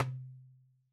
darabuka Single hit; Raw Perc One Shots; Slate; SD SEISMIC Drum Sample Pack

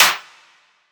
clap verbish; Drum Sample Pack; One Shots; Claps; Slate; SEISMIC

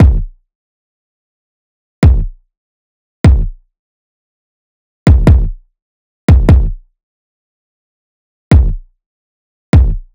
kick 148, Kick Loops; drum sample pack; Butajira; SEISMIC; Slate

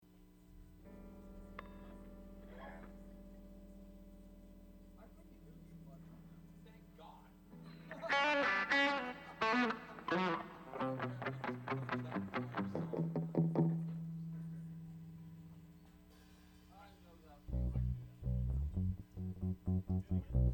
Strings Mix

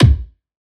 kick roomfaze; Drum One Shots; SD MIDNIGHT Sample Pack; Slate

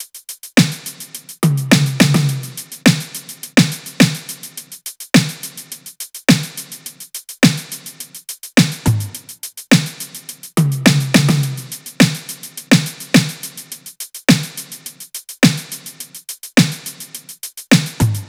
top 105, Drum Loops; SD MIDNIGHT Sample Pack; Slate

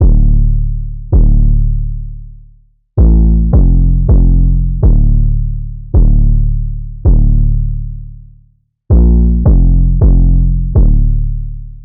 808 loop 162 Dm; Slate Digital BASSHOLE Sample Pack; 808 Loops

